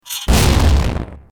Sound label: crash; RallyX